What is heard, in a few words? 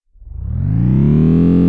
generator in